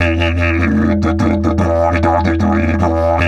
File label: 150, DIDGE RIFFS